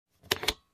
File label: Case click